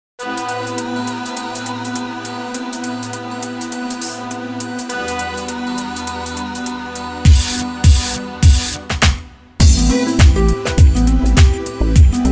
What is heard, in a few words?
Let the beat hit em!, CLASSIC R&B STYLE